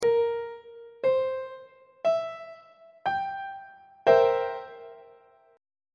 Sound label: b sharp dominant 7th 3rd inversion on treble clef